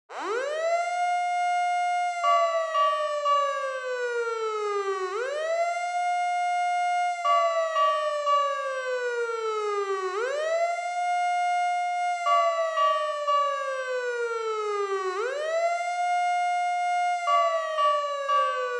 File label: Fire Truck Siren Close